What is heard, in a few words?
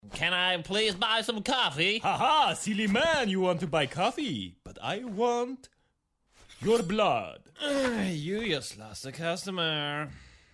Ha HA